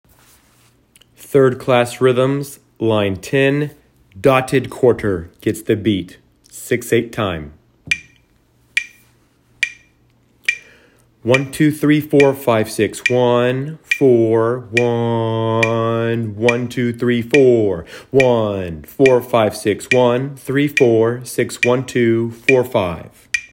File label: rhythms